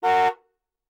car horn

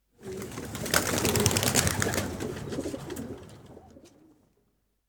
flapping and flying; افکت صوتی

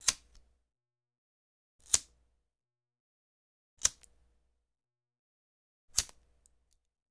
lighter

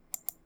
click mouse

